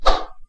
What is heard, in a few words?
throw